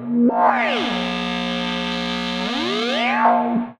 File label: Simple OSC Spring Reverb; radiosonics; Classic Simple OSC FX